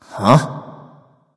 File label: confused; smite; loki